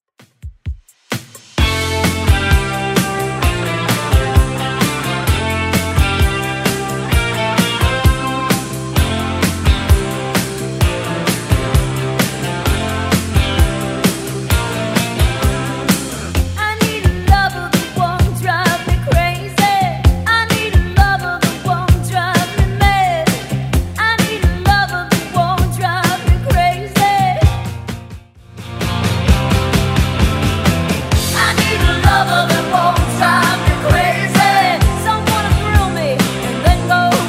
80s redrum 130 bpm

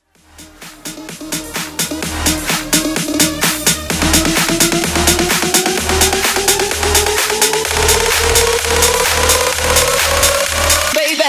Bootleg Transition

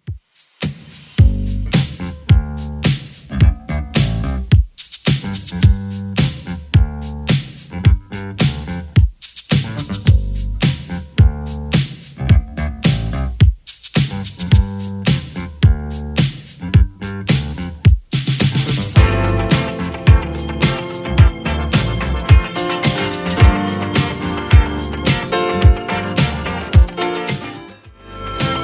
80s 108bpm; Redrum

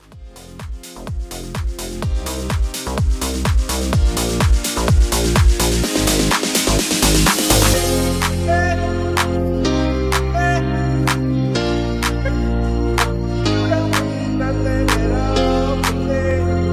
House Mashup 126

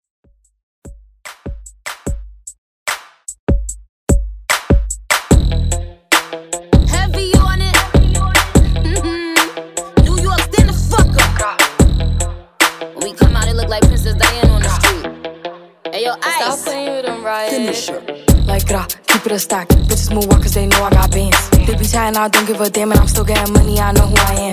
Hiphop Redrum

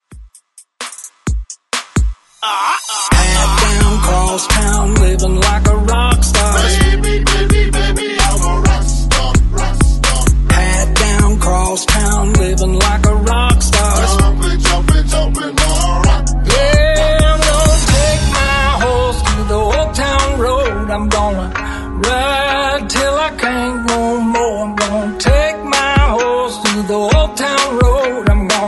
Bootymix Bpm 130

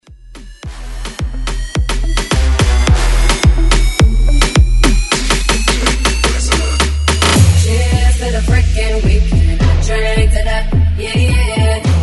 Moombah Bootleg

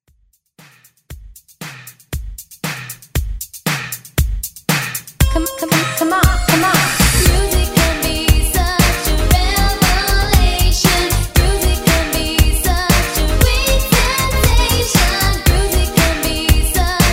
80s Dance Quick Redrum

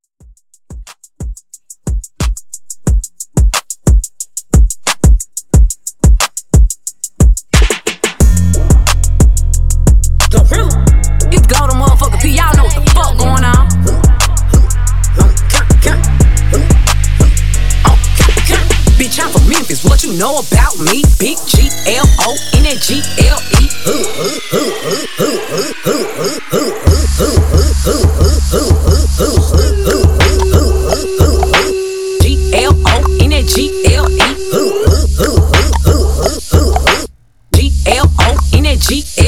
Mashup

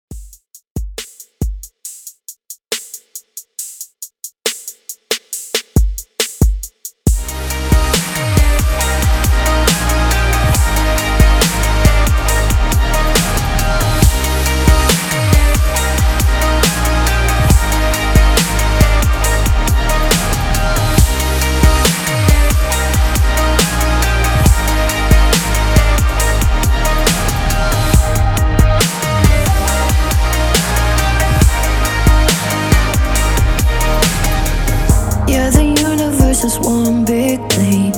REDRUM 69 BPM

